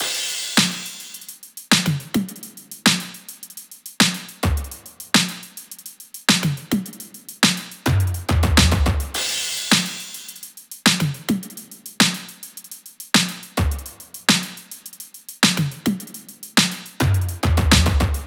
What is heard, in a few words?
top 105, Drum Loops; Slate; SD MIDNIGHT Sample Pack; Camaro